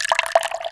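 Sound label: potion pour; The Elder Scrolls III - Morrowind; Morrowind GOTY GOG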